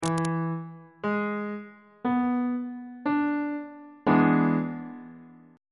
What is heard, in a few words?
d double sharp dominant 7th chord on bass clef